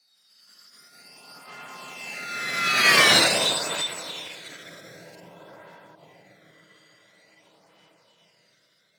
Jet By Processed - Passing Space Ship - Thin Jet; Hollywood Edge; sound designer's toolkit v2

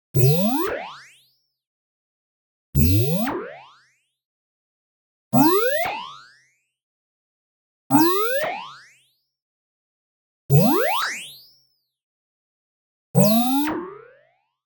TONAL Oscillator and Noise Ascending; boom library; Anime Essentials

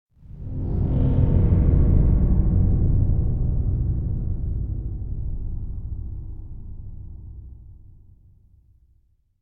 Metallic Groan Low Vibrations Drone; Hollywood Edge; sound designer's toolkit v2

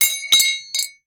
Metal Clang; 1001 Sound Effects

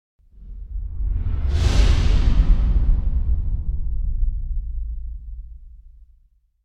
Impact Distant Deep Short Depressive Pad; Hollywood Edge; sound designer's toolkit v2

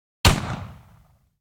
gun rifle; NCH Library

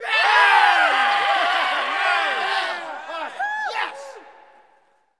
Hockey Players Cheer; Hockey Vocal Sounds

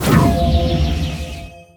Super Spell Cast; AudioWizards

